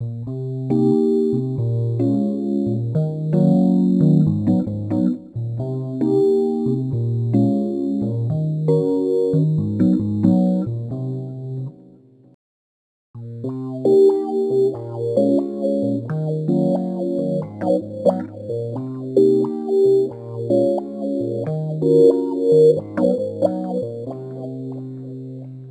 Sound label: Rhodes Attacks; Ueberschall